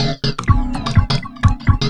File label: lop 126bpm, House Instr and Stab Loops